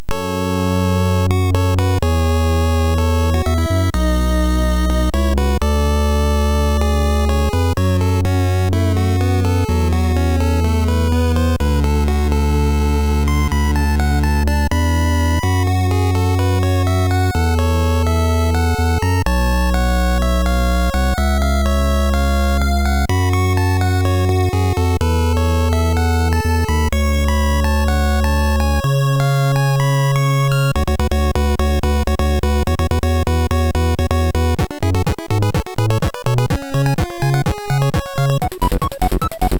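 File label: Commodore 64 SID, MOS6581R4; Koekkoe-Maen 2 C64 hax0r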